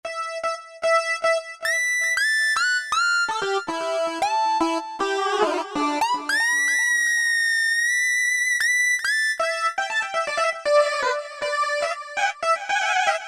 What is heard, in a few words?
Harmonic Lead, Roland U-220; Factory Patches